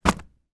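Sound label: household telephone directory place down on floor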